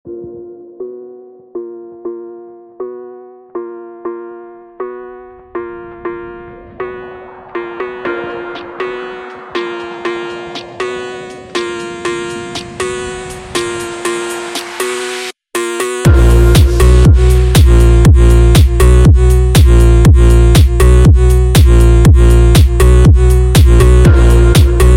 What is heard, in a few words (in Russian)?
Бразильский фонк для зала и тренировки